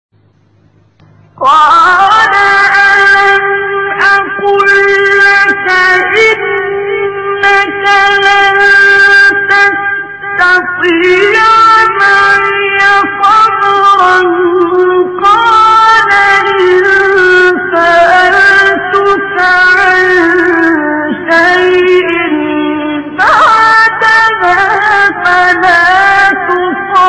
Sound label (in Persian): تلاوت گوشنواز و جذاب آیه سوره مبارکه کهف; 75; عبدالباسط